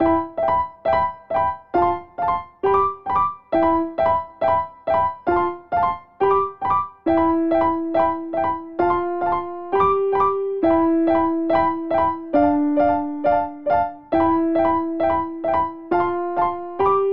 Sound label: Progression Arpeggiated Broken Chords iii - IV - V C Major 68 bpm, Grand Piano; MIDI Clips; Ableton; Factory Packs